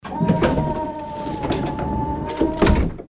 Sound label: door automatic